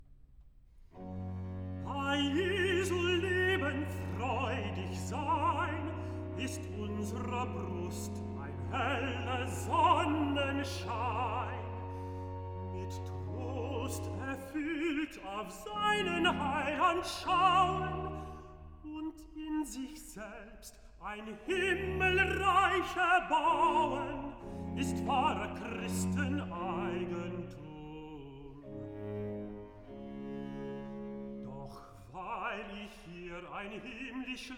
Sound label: Recitative; Bach; J S Bach; Easter Cantatas; Erfreut euch, ihr Herzen Cantata, BWV 66